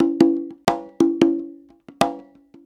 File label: 90 PERC